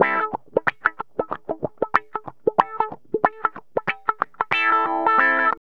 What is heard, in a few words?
GTR, Funky; Zero-G; Funky Elements